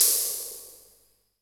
SOLT CYM, Solton Disco-64

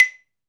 MARIMBA B 3; AKAI S-Series CD-ROM Sound Library VOL-7; MINI MARIMBA

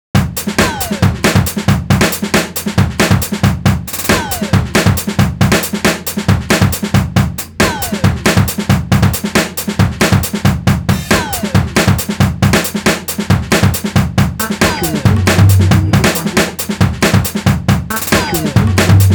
Beat Street 137; AMG - Ruff Cutz

